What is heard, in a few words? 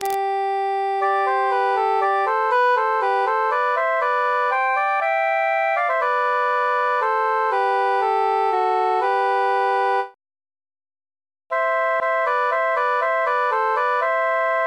nightingale tenor mute